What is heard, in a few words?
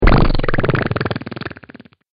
Big Muddy Splat, slimy